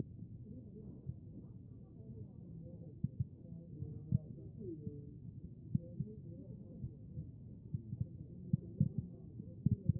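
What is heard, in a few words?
anterior chest, position 1; B MODE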